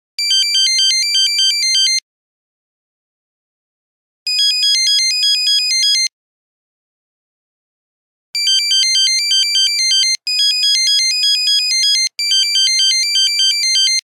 Nokia 3410 Samba rumble, Nokia Buzzer; Windows Phone apps

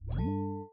Wireless Charging Started; Infinix; XOS 16; ui

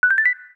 call connect, system; Samsung SGH-T369 Linx

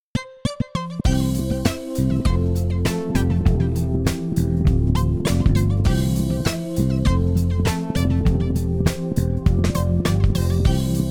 Happy Morning, alarms

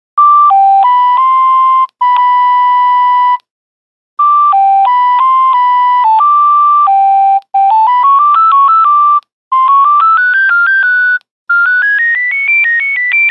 Nokia 9210 Tchaikovsky, Nokia Buzzer; Windows Phone apps

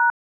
Key Sharp; Samsung; GT-S8600 Wave III

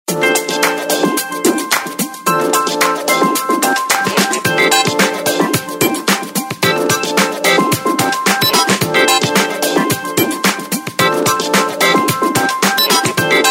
Ringtone, Samsung GT-C3110; Southeast Asia

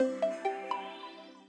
front camera rhythm popuped; Vivo X27 Pro; ui

